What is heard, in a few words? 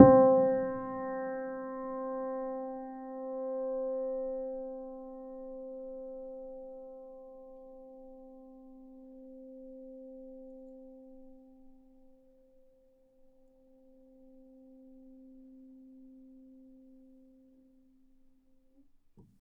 Felt Piano C3; Synthetic's Serum Collection; Aubit; ODESSA Vol. 3